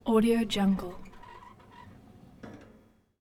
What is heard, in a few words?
microwave oven door open close movement; domestic sounds